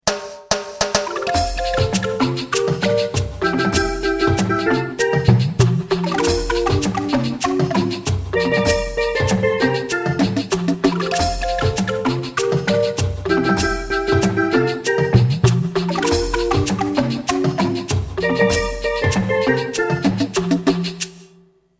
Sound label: Jingle